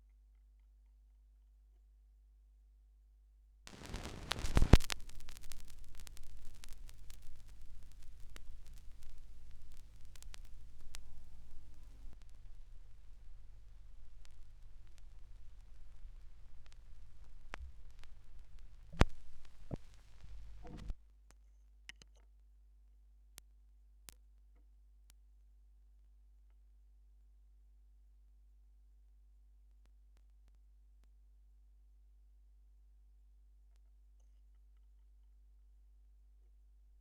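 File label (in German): schallplattengeraeusche, vinyl record noise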